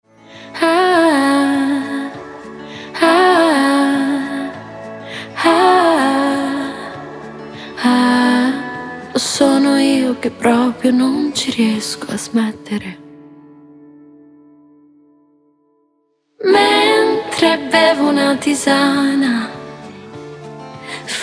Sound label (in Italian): VOCI